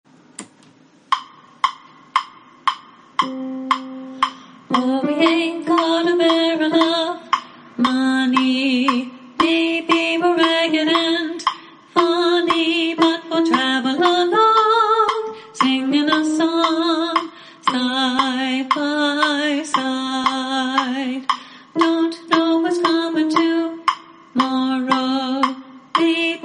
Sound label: alto